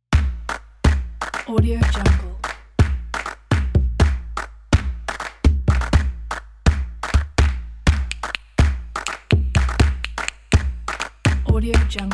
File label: claps